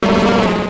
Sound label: cry